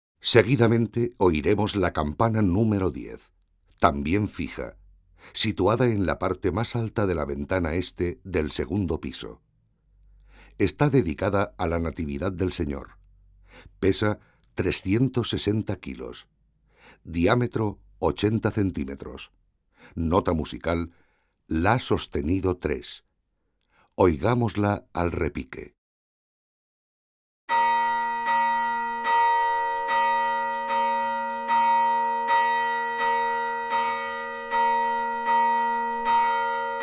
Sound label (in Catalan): campana